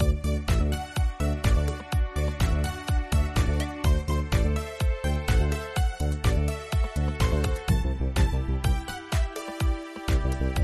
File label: Music Festival Island Music; dragon city